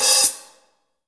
HH hh; Best Service Gigapack 2

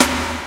SD HOU, Dance Mega Drums; Best Service

